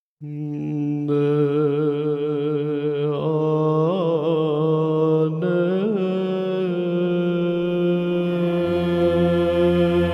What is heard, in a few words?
Spanish Tone 2; O Gladsome Light